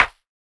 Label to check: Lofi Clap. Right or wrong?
Right